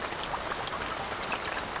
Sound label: rain; Ambient; MX vs ATV Unleashed; XBOX